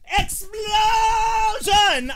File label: Explosion; VRchat